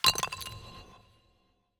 dark crystal obtaiment

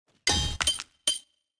environment successful build stinger

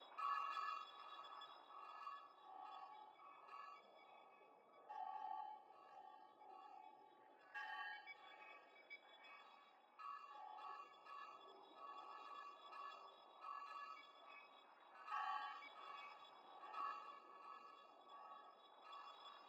ambience room crystal loop

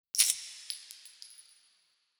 ui debt

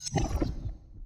room deactivation

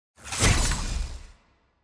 Orbital Dagger Throw; TANK; 3Souls

